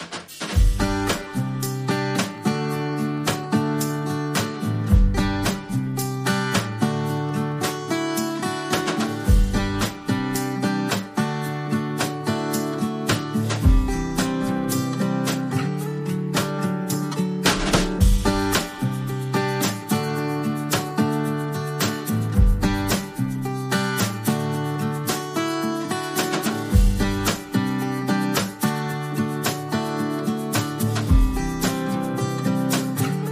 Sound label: Drums and Acoustic Guitars Only